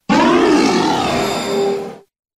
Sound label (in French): Cri Crinière du Couchant; USUL